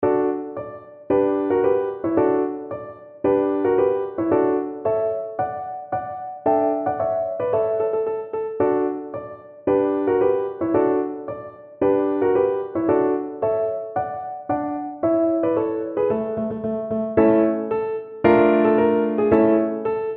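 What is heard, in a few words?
tchaikovsky piano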